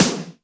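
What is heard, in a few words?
Snare Air Burst, Alesis D4 drum machine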